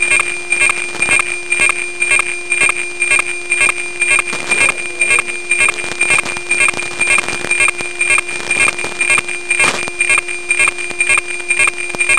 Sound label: meteor